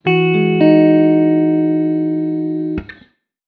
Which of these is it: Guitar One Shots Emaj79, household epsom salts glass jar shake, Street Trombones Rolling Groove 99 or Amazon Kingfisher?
Guitar One Shots Emaj79